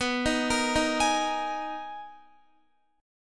B Major 13th B down Guitar; BM13; arpeggiated; JGuitar

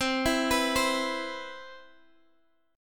C Major 7th, chord

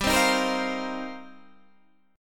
C Minor 11th G

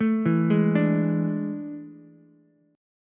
A Major 7th Suspended 4th, Ukulele; AM7sus4; arpeggiated; JGuitar

